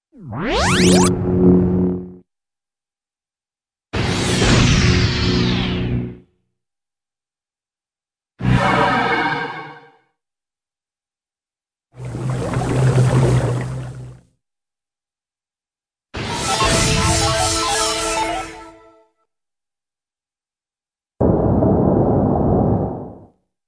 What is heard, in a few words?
Various Laser Zaps & Blasts; Hollywood Edge Cartoon Trax